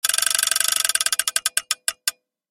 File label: Spinning Wheel